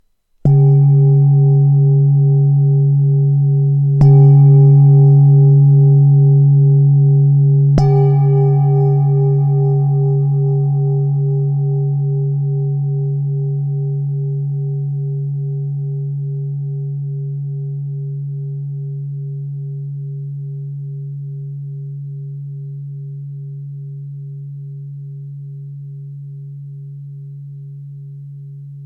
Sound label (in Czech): tibetska misa; c 3; 20cm